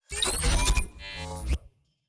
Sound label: Success; GameDevTV Rocket Boost